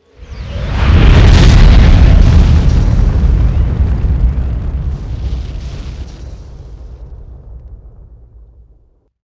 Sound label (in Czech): ohen; Mafie 1